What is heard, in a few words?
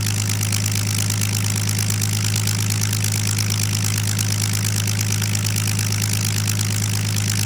Plane P40 Idle Loop; Airplanes